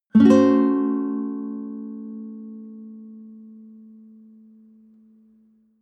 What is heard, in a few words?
nylon guitar chord high diminished